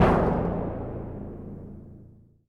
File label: big combo; Swapperoo; Android iOS mobile Switch Windows